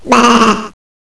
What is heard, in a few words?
Sheep; Space Sirens